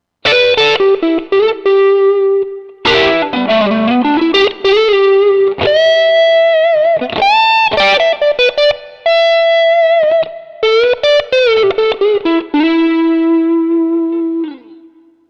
strat dirty

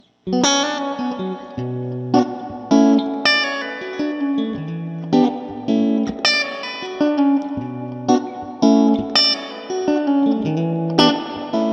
1955 Middle Bridge; Klein; Epic

